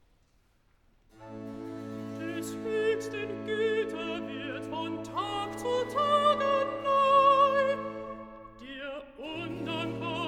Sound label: Recitativo (Alt), BACH for ALTO; Des Hцchsten Gьte wird von Tag zu Tage neu